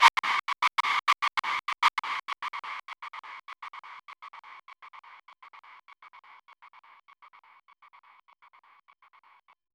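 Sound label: dark trap transition eerie, vinyl scratch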